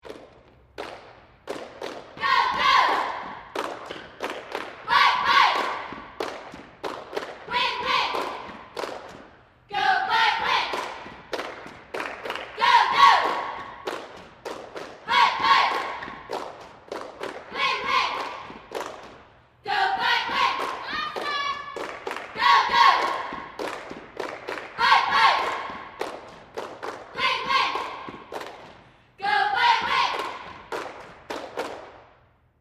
Cheerleaders High School; Cheer 2; Go Go Fight Fight Win Win, Interior Reverb, Teen Girls